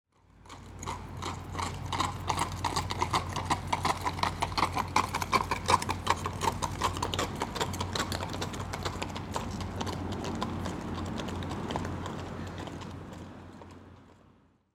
horse walk on cobblestones

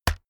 keyboard external key hit push

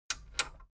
metal button radio click disable slow

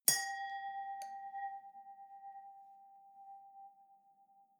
wine glass hit ring fluctuate

